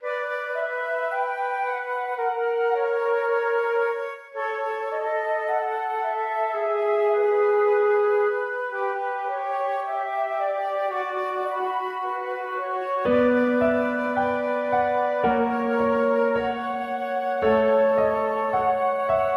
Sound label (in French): sonate; Casadesus Axel; gloups